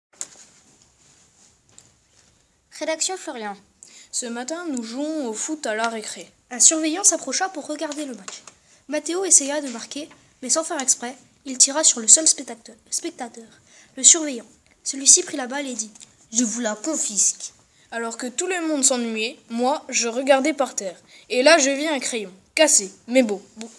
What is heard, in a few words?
Vocal